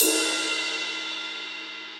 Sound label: Ride Edge Studio Basic; Drums; Drum Hits; Standard Hits; Ableton; Session Drums Studio